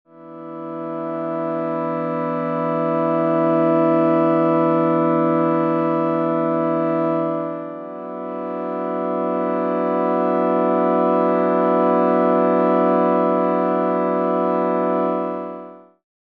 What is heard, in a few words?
Env